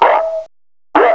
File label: SYN BARK